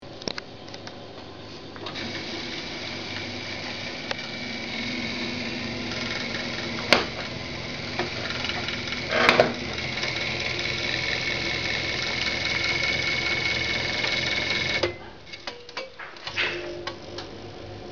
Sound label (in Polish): pompa